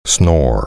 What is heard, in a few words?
snore